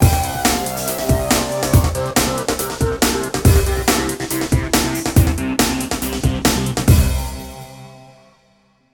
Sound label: Signature Sound; FXHome; Signature Tracks